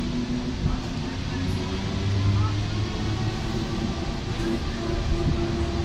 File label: acceleration, Metro